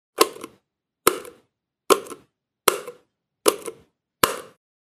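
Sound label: switch; zvuk vykljuchatelja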